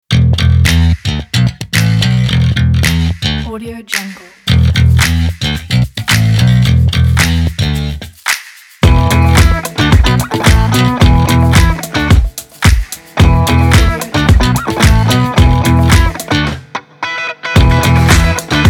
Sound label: funk kit